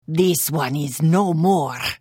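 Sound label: Attack, Khajiit female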